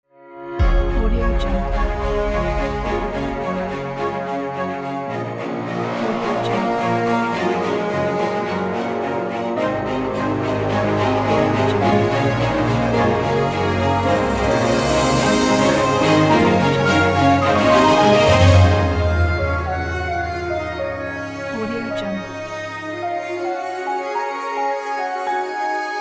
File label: cinematic ident